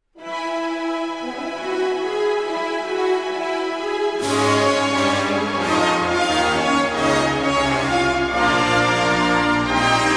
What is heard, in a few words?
FANFARE